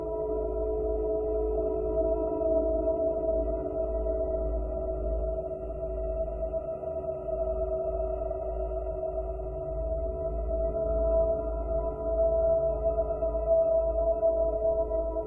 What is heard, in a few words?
drone, ambient drones; Left 4 Dead 2; Source Engine